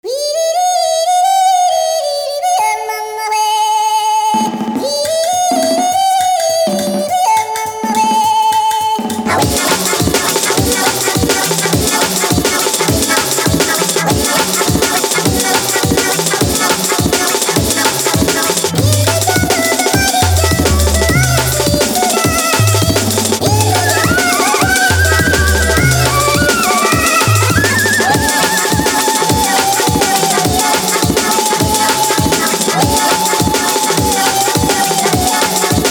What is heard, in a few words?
venetian maracas